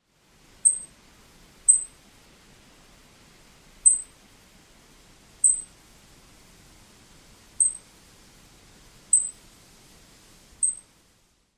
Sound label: tsip calls